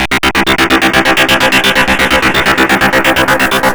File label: GRUNGE